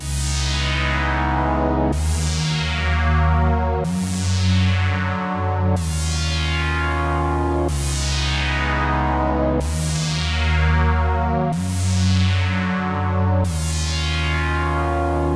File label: Rounds Chords